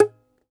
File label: DOMRA, Segundo Domra, Plucked Strings